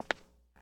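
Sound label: Foot Concrete Shoes Walk; Lucius III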